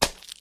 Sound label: spider egg